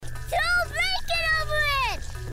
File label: Throw a blanket